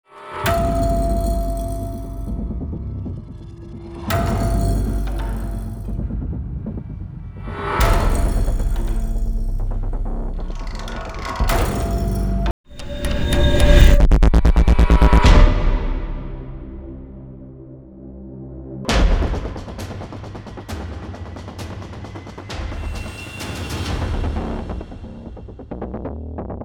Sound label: Music; Fatal Attraction Generic